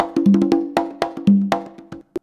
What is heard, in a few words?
CONGA 119, Modern Percussion Loops